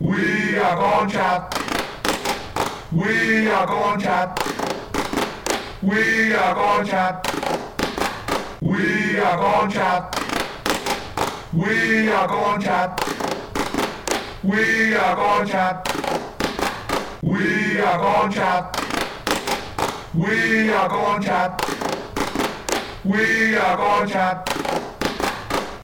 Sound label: we are contras clap, fanchants